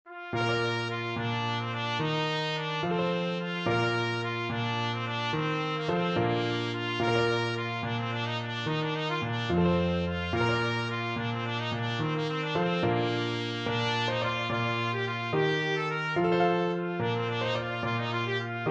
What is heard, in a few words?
trumpet